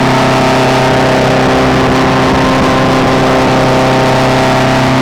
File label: i4 petrol, engines; i4 petrol20 2; ACF CustomMod